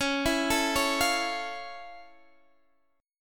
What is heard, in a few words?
F Augmented Major 7th C sharp